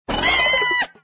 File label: emotion shocked; wanNingTaiQiu